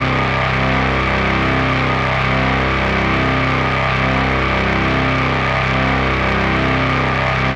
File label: Dirty Synth C2